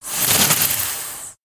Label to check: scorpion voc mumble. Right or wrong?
Right